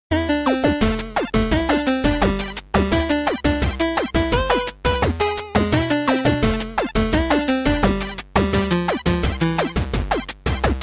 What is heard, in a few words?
8 bit